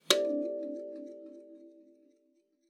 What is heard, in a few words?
KALIMBA D5; Xsample VOL-7